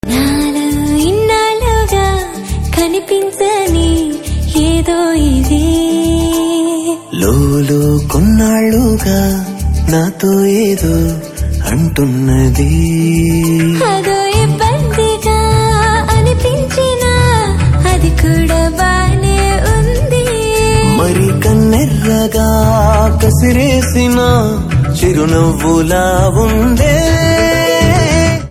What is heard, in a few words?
Ringtone